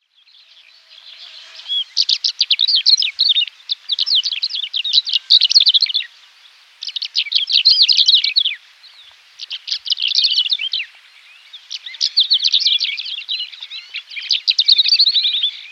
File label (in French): alouette calandrelle